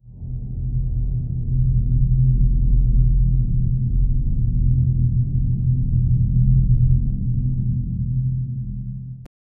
eerie underwater silence

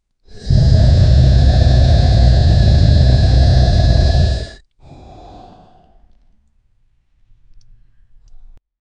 dragon using its fire bre

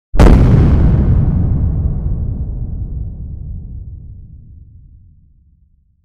a sound of a single, explosions

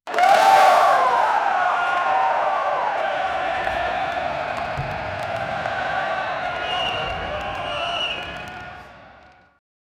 final buzzer at basketbal

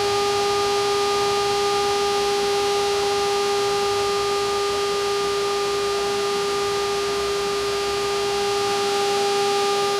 appliances

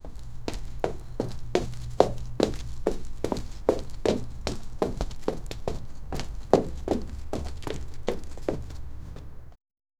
two people foot steps; walking

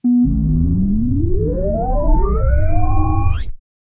a sci fi style sound effect, spaceship

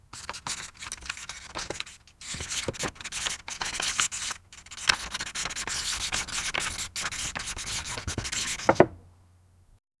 cutting paper

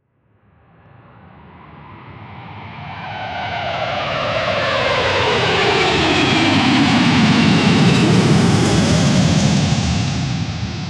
a jet taking off; aviation

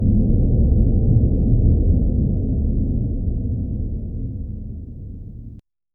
reverb heavy ambience

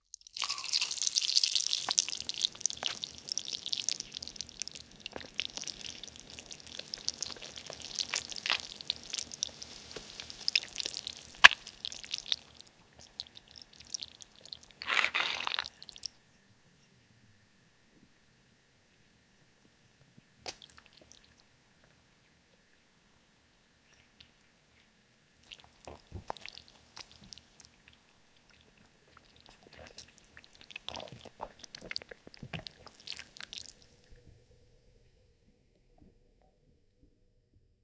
wading through hungry mud

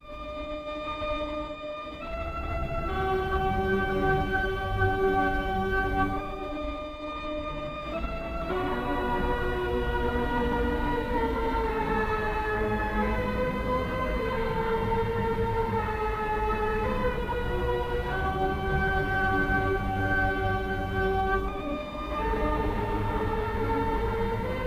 background music with a g; alarming

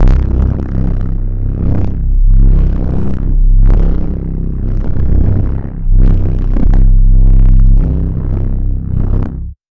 error bass horror

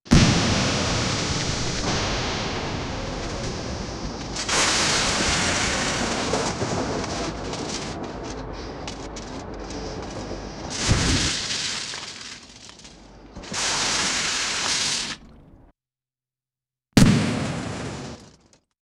plant crash explosion

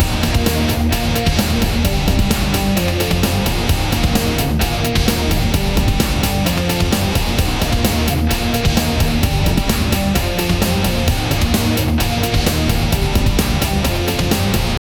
ringtone in rock style

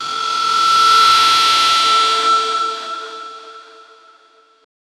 horror audio stinger, electric guitar; horror audio stinger that

